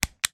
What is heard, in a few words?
household video game controller button press single; nintendo wii; sound effects; sound effects one